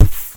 Beat Box Kick

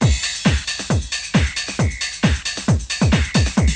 Hard American House

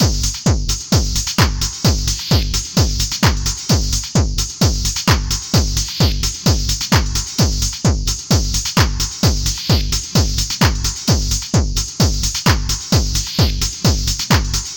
Hard Techno

